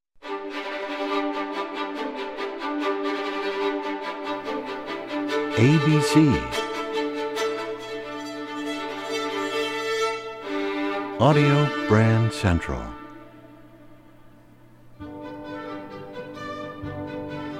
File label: Mussorgsky Hopak; ABC Classical Trax